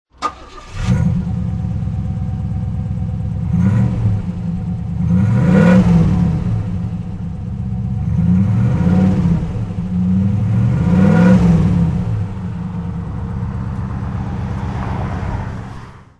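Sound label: rover p5b coupe throttle, engine sound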